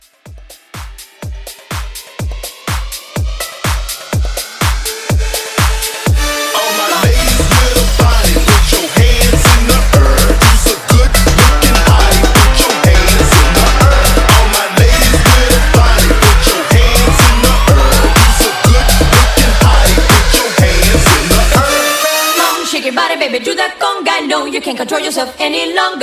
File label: Conga Latin House 124; Mixshow Edit